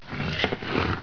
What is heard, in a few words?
foot drag; gearbox; demoman